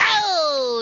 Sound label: auuu